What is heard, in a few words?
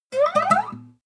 string chirp slide up triple